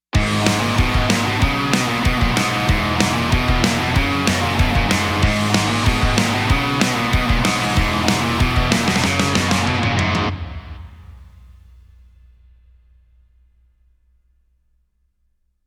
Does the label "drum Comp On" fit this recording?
Yes